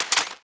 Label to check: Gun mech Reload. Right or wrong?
Right